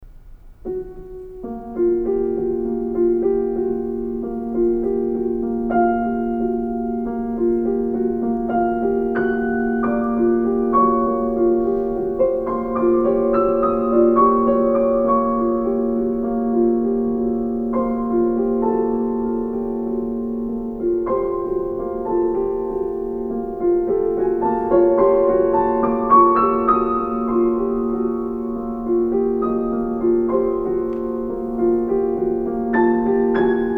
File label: Five Pieces for Piano Flowing